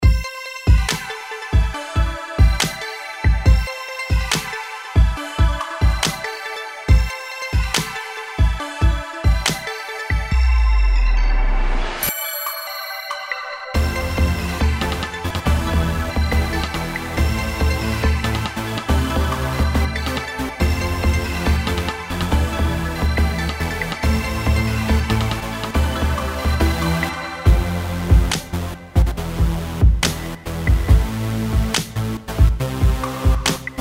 inst

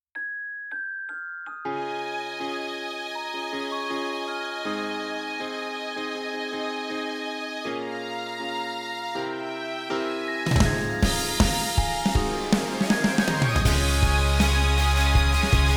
offvocal

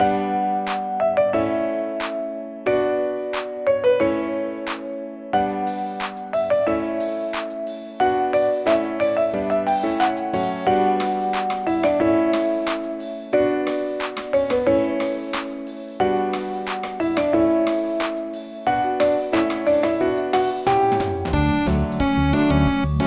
Off Vocal

